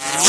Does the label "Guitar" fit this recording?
No